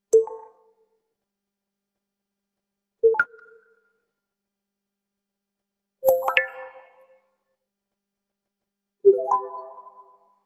Soft UI click, minimal, clean transient, no reverb; 0.15s; UI-клики, мобильные приложения, web-интерфейсы